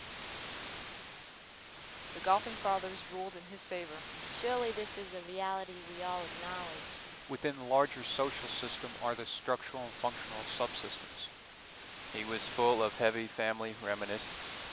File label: noisy mod white; Noise Power Spectral Density Estimation; wiener